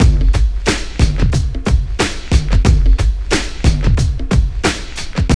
TRIP 90, trip loop

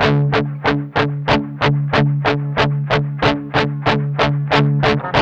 MED ROCK 8TH D#3, Guitars; Spectrasonics; Hans Zimmer Guitars VOL-1; 102-MED ROCK

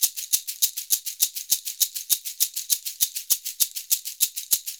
SHK, Shaker; Univers Sons; Basicussions

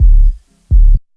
DRUMLOOP, Ragga & Dub Reggae

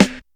SNARE, Skanky Perc; E-MU Formula 4000 Series Vol. 1; Hip Hop Nation

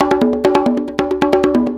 Congas, Perc Loops; Drum Tools

